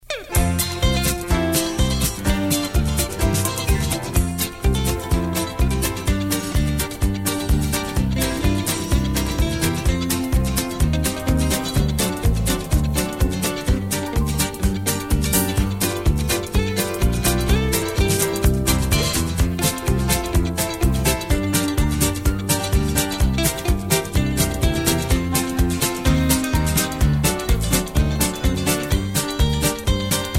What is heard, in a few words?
music